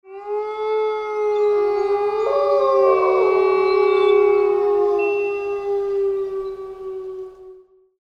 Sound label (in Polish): wilki